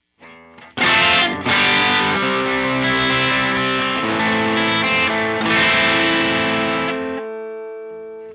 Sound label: JC Elec Guitar; StageOne